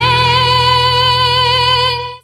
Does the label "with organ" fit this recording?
Yes